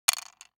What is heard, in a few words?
DICE on Wooden Tray, Throw and Roll, Mini, 3 Three Dice; Samples; ArticulatedSounds; DICE Sfx Party (Extended)